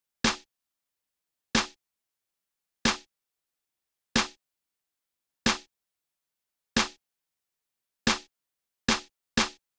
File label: Snare, 092 Cmin, Drum Tracks; Hip Hop; Big Fish Audio; Hip Hop Exotica